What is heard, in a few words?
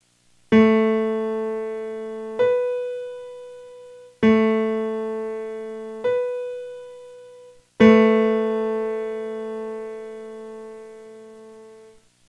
Not Octaves, Pitch; Music by the Numbers